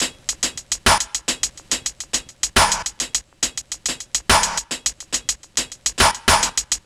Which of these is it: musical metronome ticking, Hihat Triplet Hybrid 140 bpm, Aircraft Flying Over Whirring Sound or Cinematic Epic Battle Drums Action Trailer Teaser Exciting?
Hihat Triplet Hybrid 140 bpm